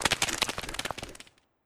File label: Skell Dog Run